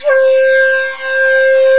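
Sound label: shakuhachi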